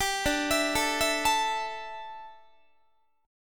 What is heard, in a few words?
D Suspended 2nd Suspended 4th D down Guitar